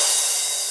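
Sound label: drum crash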